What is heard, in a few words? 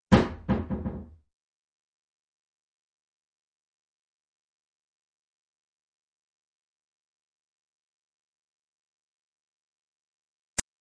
Track, Doors; 헐리웃특수효과음; 문 소리